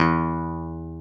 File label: E2, CLAV; Sonic Reality; Vintage Timetraveler; CLAV PIANET